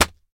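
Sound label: Dictionary Slam Close, books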